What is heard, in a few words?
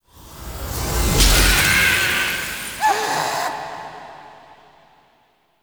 Queen Collapse; Warframe; The War Within; Mirror; Cinematics